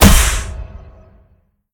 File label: pwr hit stun god; smite; gilgamesh